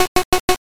chew

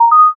m notify

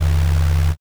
vibrate

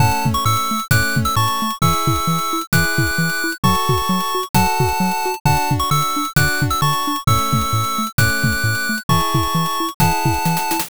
bgm

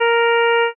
trumpet

